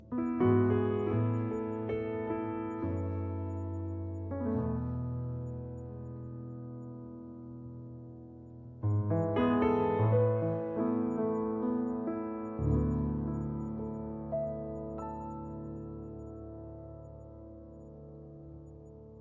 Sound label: Solo Piano Music